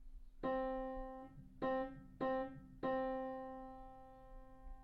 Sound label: rhythm; dog birdy bull moose; rhythm train book 1